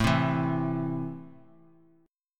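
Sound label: A Minor, chord strum; Am strummed; x,12,10,9; JGuitar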